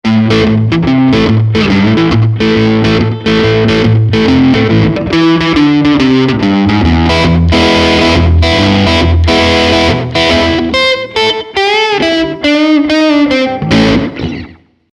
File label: Ibanez AF 75 OD